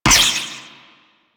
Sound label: Ricochet; Warframe; Cowgirl